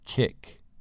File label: kick